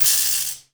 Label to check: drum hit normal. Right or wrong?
Wrong